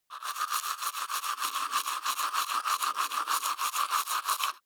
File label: teeth brush wet open molars